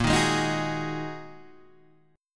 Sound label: Bb 7th Suspended 4th Sharp 5th Bb Guitar Open D